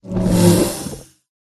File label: turtle attack middle